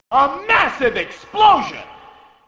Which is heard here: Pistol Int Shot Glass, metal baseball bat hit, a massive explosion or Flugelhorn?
a massive explosion